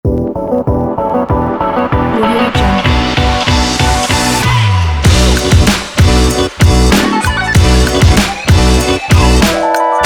hip hop

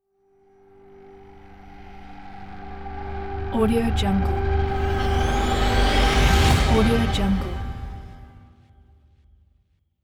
rising fx; musicball

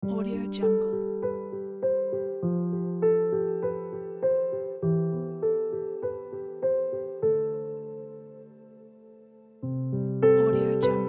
piano drama

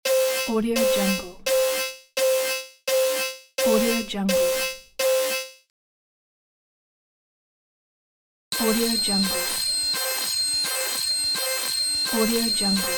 digital alarm

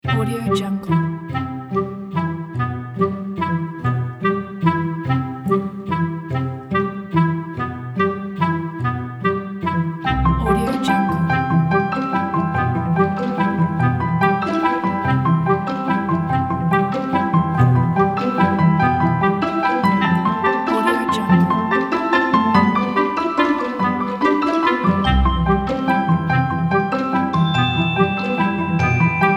minimal; audiophilemusic

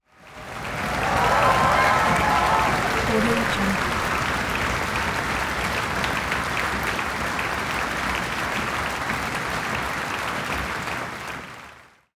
concert hall stomping cheering

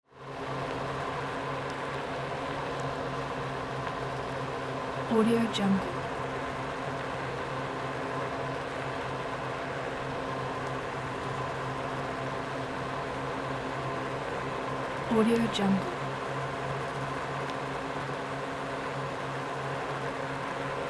electric scooter outdoor ride constant speed

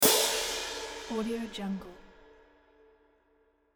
cymbal crash

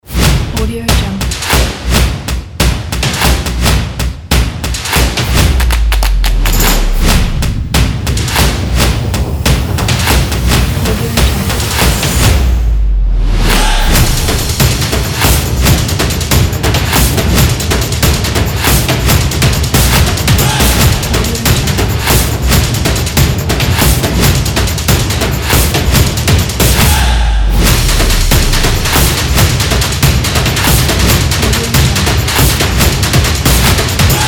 epic taiko drums pack

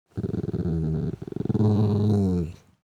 animal dog small growl wavy; smartsoundfx

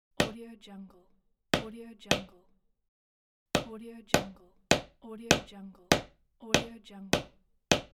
hammer hits

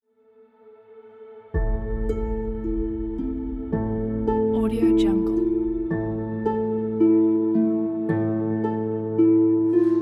dark gothic fairy tale

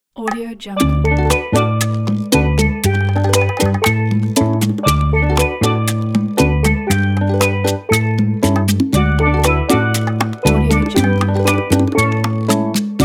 hawaiian sun

